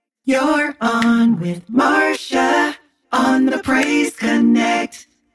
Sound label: Jingle; Octavia Praise Connect